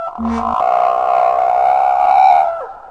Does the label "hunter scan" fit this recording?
Yes